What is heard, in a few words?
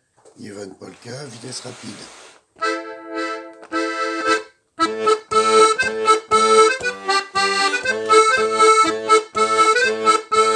polka